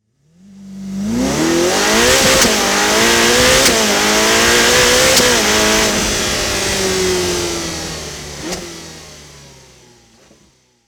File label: SF90 XX Stradale sound